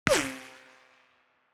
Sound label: weapons gun shot ricochet drop